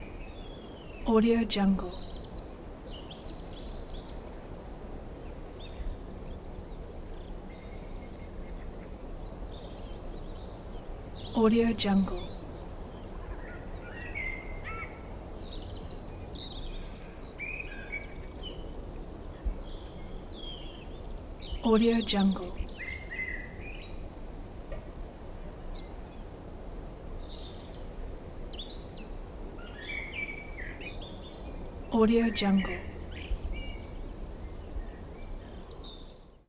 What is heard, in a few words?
birds and wind